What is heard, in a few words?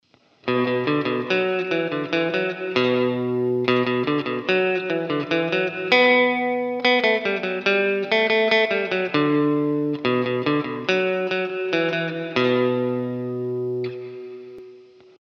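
Ducking, Line 6 M13 guitar processor; затухает с ростом громкости входного сигнала, и нарастает с затуханием в ходного сигнала. То есть хвосты растуту когда вы прекращаете бить по струнам.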